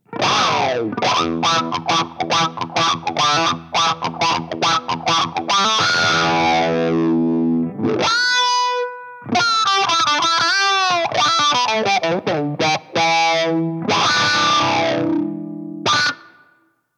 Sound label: Drive Attack